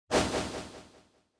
Motion Agility Delay

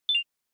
SNES Action Item

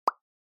Onoma Pop High Dry

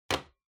Flip Clock, Put

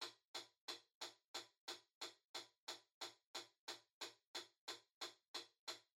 Metronome (Far-Click-Loop-Fast)

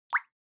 Water Drop Mid Dry

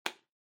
Bingo Card, Punch

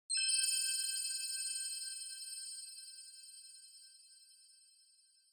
Onoma Sparkle Short; オノマトペ・キラキラ

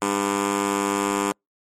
Quiz Wrong Buzzer

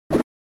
Gen Fighting Swing

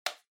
Handheld Transceiver Grab

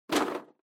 Drawing Box Hand In Strong

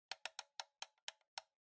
Clock Alarm (Dial)

